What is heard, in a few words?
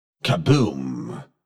kaboom